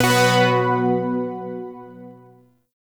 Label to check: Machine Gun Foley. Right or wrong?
Wrong